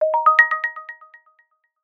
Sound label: bonus; Hangman